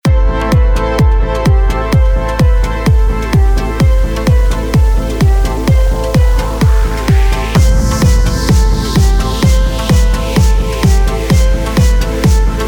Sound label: A (Electronic Dance Version); fromOsu